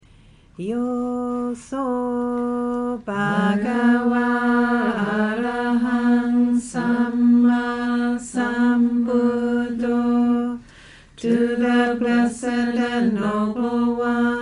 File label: morning chanting in pali english, Nuns Community, Forest Monastery; Vihara Forest Monastery Nuns Community